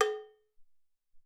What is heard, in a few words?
Cowbell